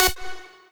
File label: lead